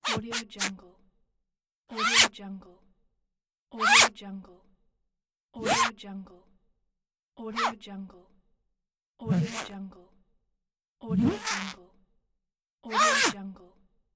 the zippers bag

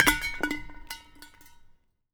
steampunk weapons grenade canister bounce; soundmorph

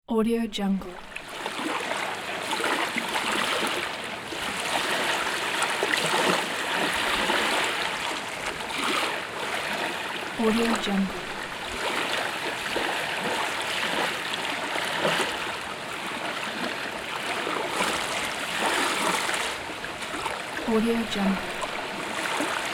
water lapping

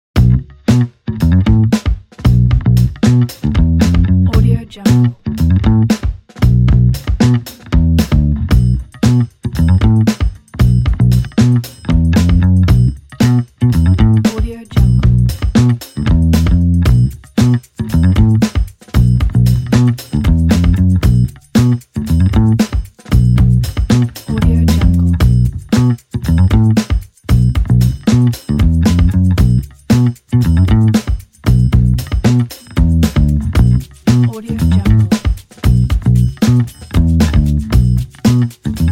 oceans funk groove pack

